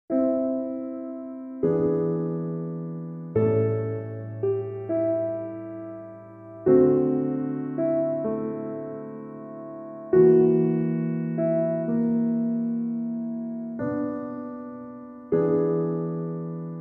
Piano Miniatures

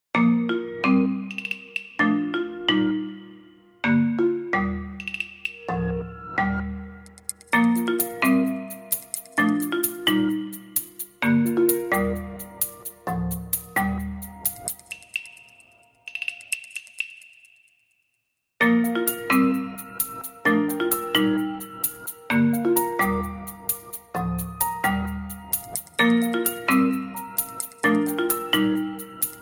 Funny Games reduced

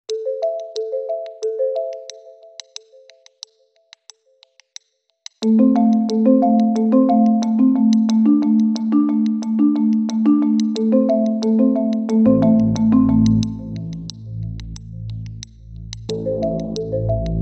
Sine Pattern Bed Reduced

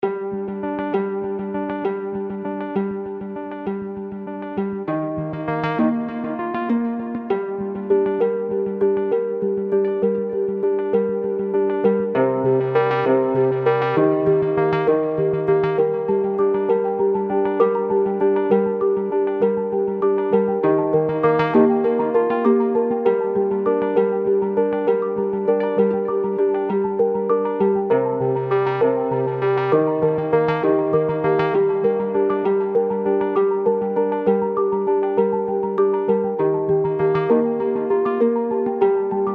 Doublings

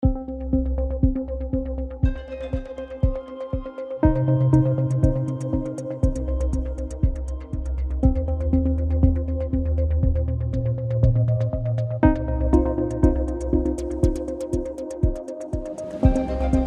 Rhodes suspense

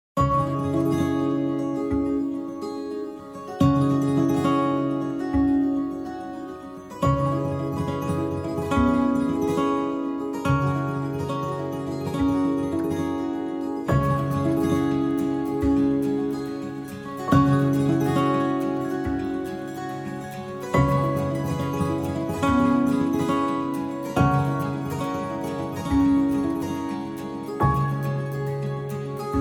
Dreamy Landscapes

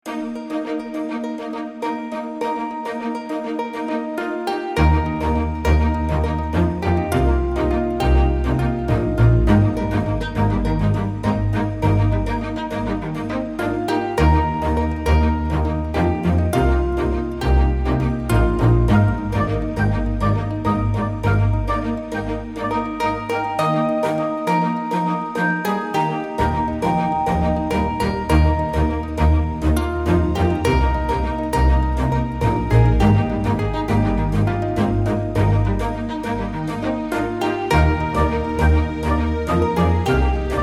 Countryside Strings

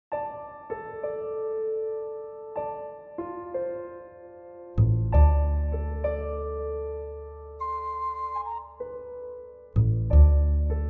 Close to Silence

